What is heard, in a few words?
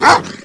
Bark